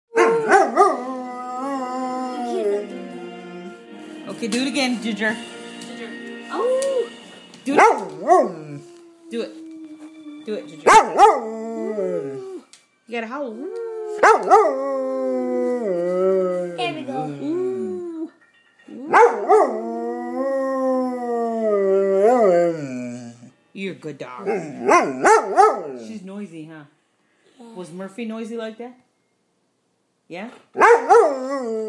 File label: dog howling